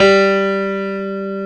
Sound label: PIANO G3; POP PF